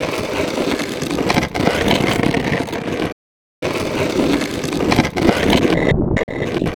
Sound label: Resynth Stone Slide